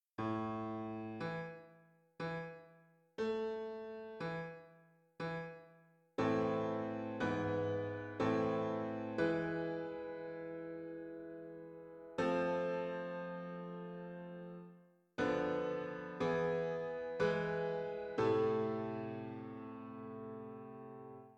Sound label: Piano